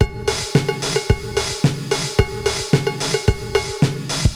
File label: 80s Gated Verb Korg MR-16 (110BPM), cyborg beats